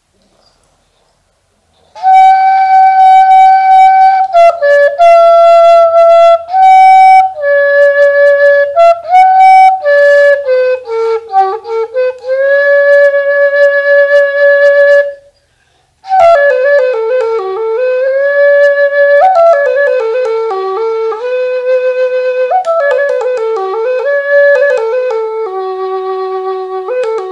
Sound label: flute